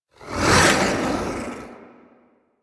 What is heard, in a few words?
Archon Whip Ranged Attack Projectile; Warframe; The New War; Nira